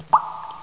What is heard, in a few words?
waterdrop; Pro Android Games; Wolf3D